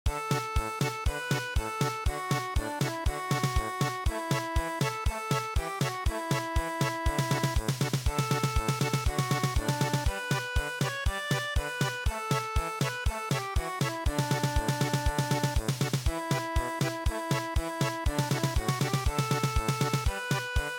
German Polka

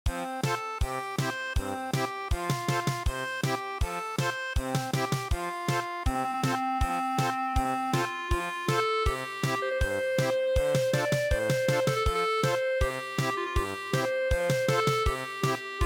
German Polka

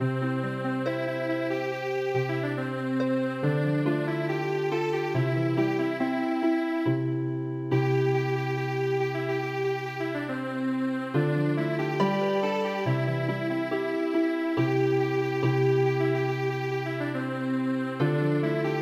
Yeungnam Healing Harp

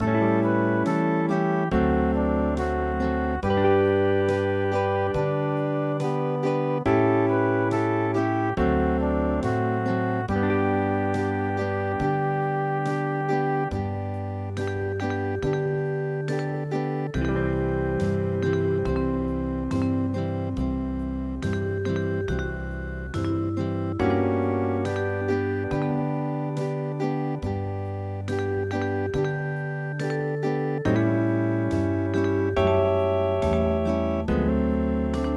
Hawaian

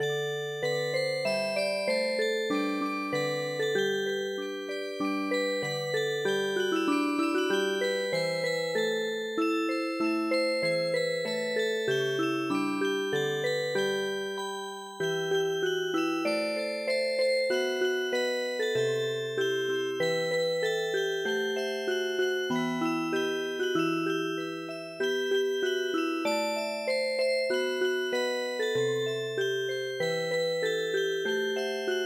Music Box

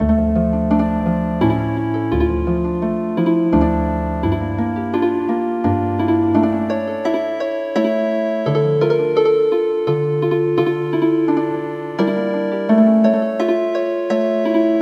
Healin Harp; midi; traditional

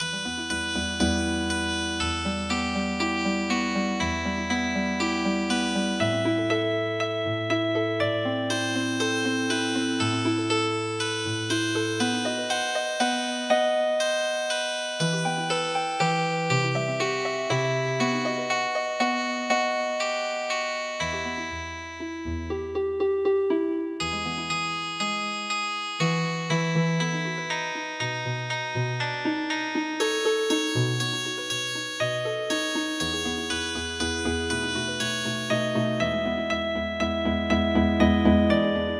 Healing Harp, traditional

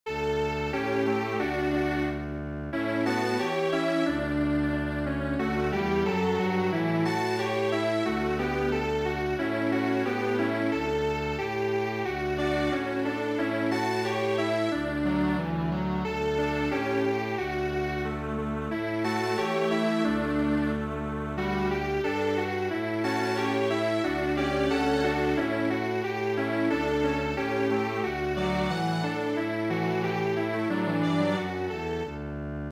Strings Quartet

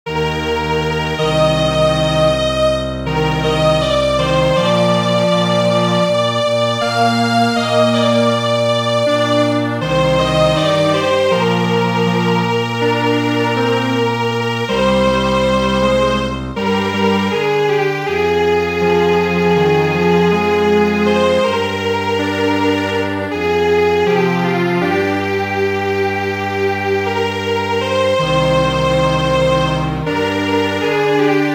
Strings Quartet, traditional

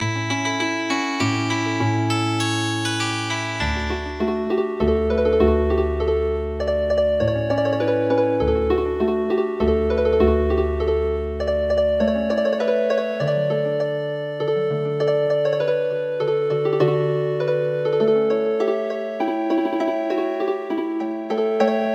Healin Harp; The beautiful Gigogin

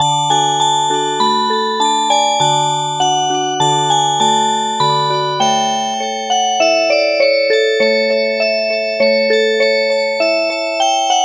Oberek Music Box

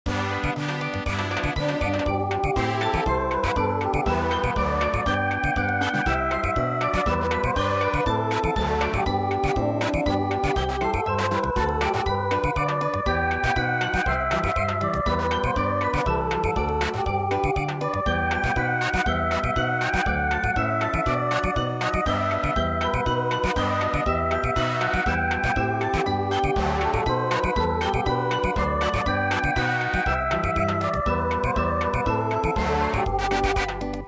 Samba

